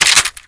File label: p90 reload clip in, foley